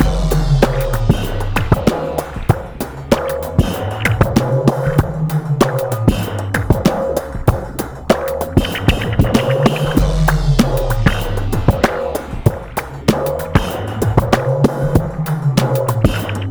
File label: 96.3 bpm, Breaks; POTBreaks